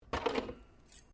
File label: cutlery general movement, Household cutlery; Kitchen Sound Effects; Kitchen (Sound Effects:Household)